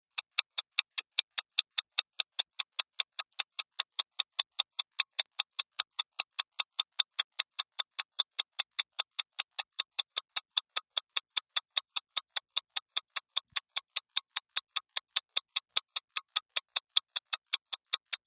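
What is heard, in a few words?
Stopwatch Tick Classic, stopwatch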